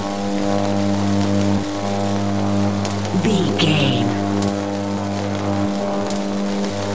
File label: electric sparks lightning loop